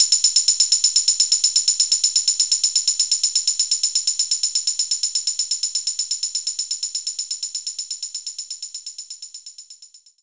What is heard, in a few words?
Tambourine